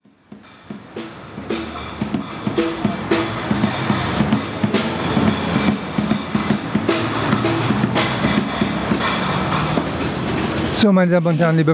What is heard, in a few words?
Deutsch drums intro